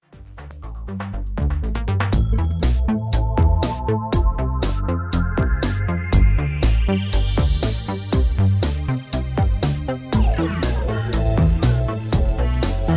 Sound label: Electro Ambient